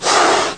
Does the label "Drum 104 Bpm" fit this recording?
No